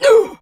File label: Pain, Battle; Warframe; Dax; DaxRanged; en